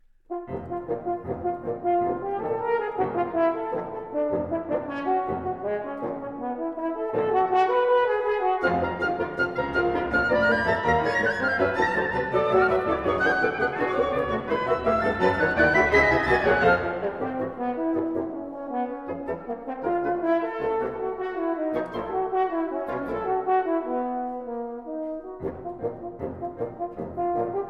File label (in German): Concerto fur Horn und Orchester II